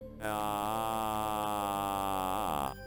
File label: aaaaaa